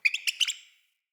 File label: Freed Hare Call; Encounters; Animal Rescue